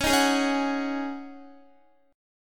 Db Suspended 2nd Suspended 4th Db Guitar; strummed

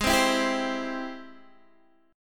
C Major, G sharp, guitar chord; strummed; JGuitar

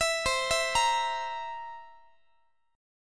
C 7th C, Guitar, chord; arpeggiated; x,x,19,22,20,21; 4A,4E,5A,5D,5G#,6C#

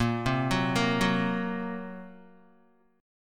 A sharp Suspended 2nd Sharp 5th, Guitar; arpeggiated; 5,3,6,3,6,x; 4F,4A,5C,5G,5C,6E; JGuitar

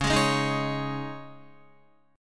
C sharp Suspended 2nd C sharp Guitar, chord; C#sus2 strummed; 8,11,8,8,8,9

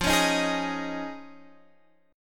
F Minor 7th Sharp 5th, F; down; chord shape

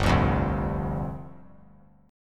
G 7th Suspended 4th G down; G7sus4 strummed

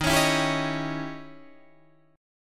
Eb 7th Flat 9th Eb, Guitar Open D

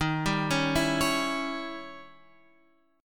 D sharp 7th Flat 9th Guitar Open F; arpeggiated; JGuitar

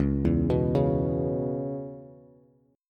Db Minor 7th Db down Bass, Dbm7 chord; arpeggiated; JGuitar

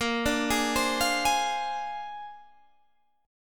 A sharp 9th A sharp, Guitar Open D

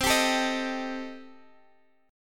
D sharp 6th Flat 5th, C

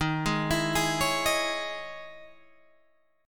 E Major 13th chord

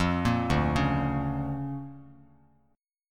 D Minor Major 7th Sharp 5th, D, Bass Standard; DmM7#5 arpeggiated; JGuitar

